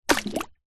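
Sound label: leisure frisbee plastic land water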